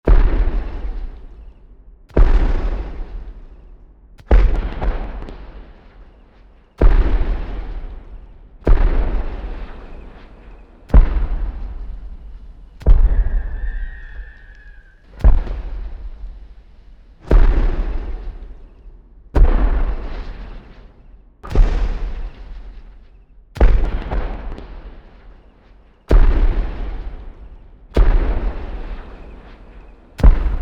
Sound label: Footsteps Animated